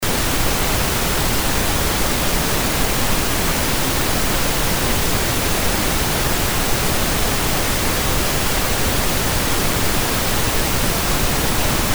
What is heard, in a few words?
noise pink